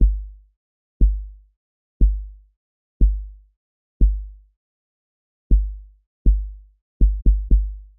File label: Breaks Kick; lmms; madmans; VariBreaks